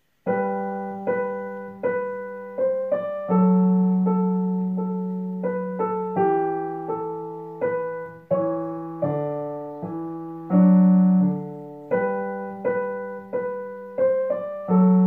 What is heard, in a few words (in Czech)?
klavir, Allegro moderato; D. G. Turk